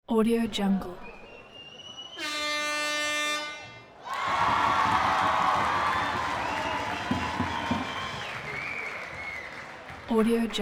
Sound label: basketball game ambience with horns; sound ideas